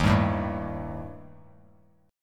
Eb Major Flat 5th Eb Bass Standard; EbMb5 strummed; 11,10,7,0; JGuitar

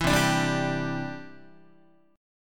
C Major Add 9th, C, down, Guitar; strummed; JGuitar

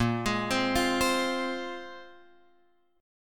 A sharp 7th Suspended 2nd, Guitar, Open F; arpeggiated; x,5,8,7,8,7; chord; JGuitar

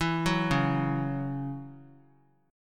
C sharp Minor Double Flat 5th; arpeggiated; x,23,21,23; 3C,3F,3A,3D

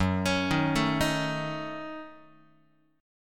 F sharp Minor 6th F sharp Guitar; arpeggiated; JGuitar

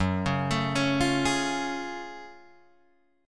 F sharp Minor Major 9th F sharp Guitar Drop D

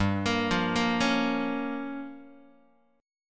G Minor 7th Flat 5th, Guitar; Gm7b5 arpeggiated; JGuitar

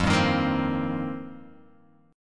F 7th Suspended 2nd Suspended 4th, Guitar Open F; F7sus2sus4; strummed

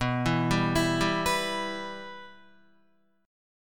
B Suspended 4th Sharp 5th, Guitar; Bsus4#5 arpeggiated; JGuitar

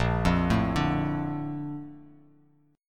Bb Major 7th, Bb, down; BbM7 arpeggiated; JGuitar

